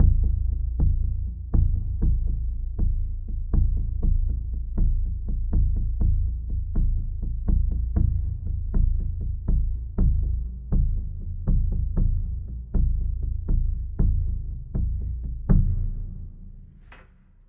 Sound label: Low Drum Rhythm Mallet 120 Bpm